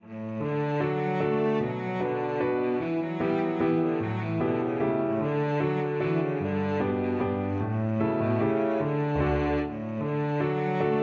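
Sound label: violoncello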